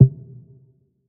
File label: match not ready; muni muni! v1.1